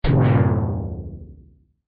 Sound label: craft fail